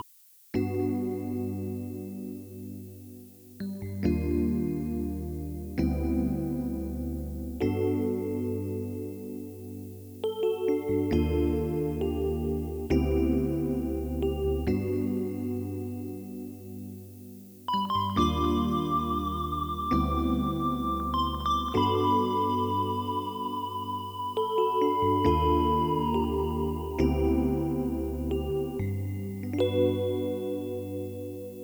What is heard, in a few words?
dithering 8bit mit noiseshaping 96khz